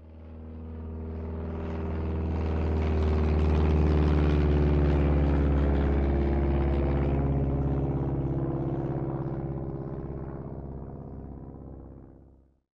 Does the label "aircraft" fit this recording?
Yes